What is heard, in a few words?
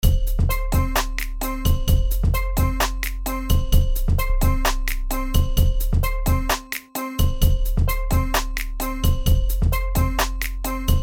bass test; sony